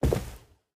cloth, step; Minecraft; Votive World

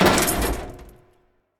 throw, iron golem; mob; Minecraft; Votive World

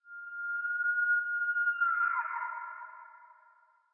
zombie pig; Minecraft; Votive World

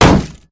block, shield; item; Minecraft; Votive World

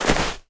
sand, step; Minecraft; Conquest Default

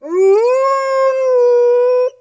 howl, wolf; mob; Minecraft; Votive World

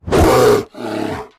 polarbear warning; mob; Minecraft; Votive World

